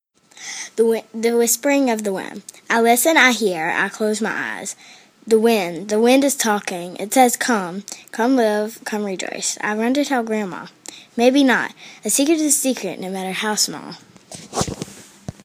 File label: Whispering